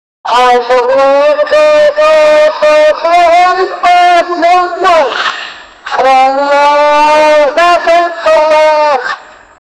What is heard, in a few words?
call to prayer, adhan